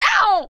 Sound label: pain; Idle Champions of the Forgotten Realms